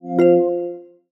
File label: Charging Started; Amazon Fire-Max-11